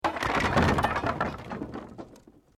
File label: Wood Collapse